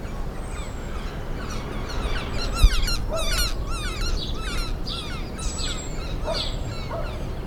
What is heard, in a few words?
Guira guira flight